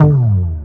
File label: Slides; Vengeance Samples; VEH1 FX